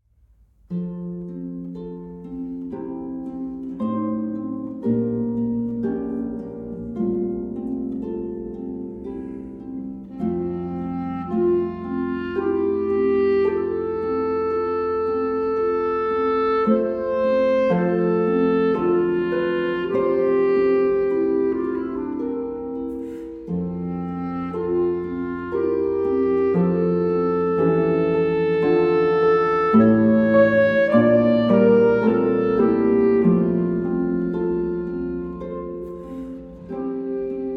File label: Arpeggione; Schubert